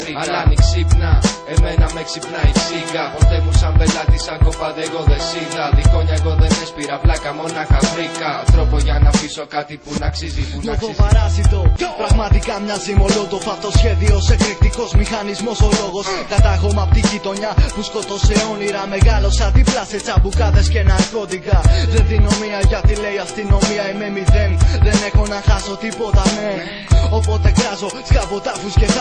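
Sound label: Is Calling R&B, ringtones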